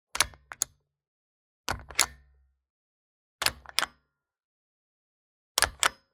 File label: Buttons Switches; soundbits